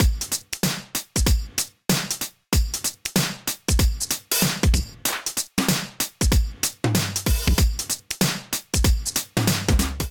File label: Hop Kit 95 Bpm, Drums; MIDI Clips; Foundation; Ableton; Factory Packs; Vibrations; ModeAudio